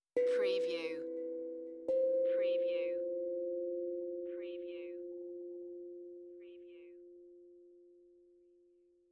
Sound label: Tongue Drum Meditative Tone; beanstalk audio